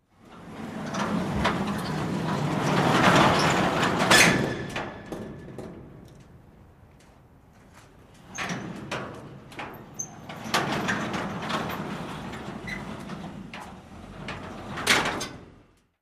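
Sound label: Metal Gate Open Close Medium, metal gate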